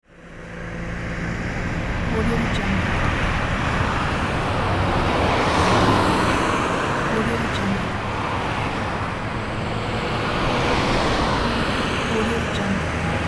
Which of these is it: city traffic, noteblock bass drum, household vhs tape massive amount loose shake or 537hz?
city traffic